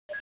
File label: timer; poland